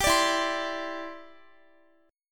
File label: E Minor 7th Sharp 5th, Guitar; strummed; x,x,17,19,16,19; 4E,4A,5D,5G,5C,6F; Em7#5